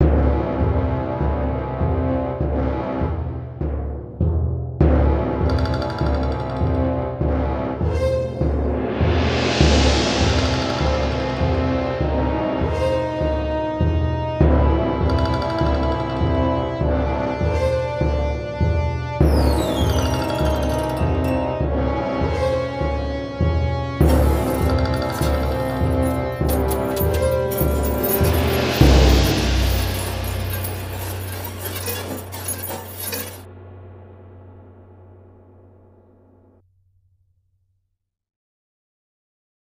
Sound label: Level Failed Music; Savage Moon: The Hera Campaign; PSP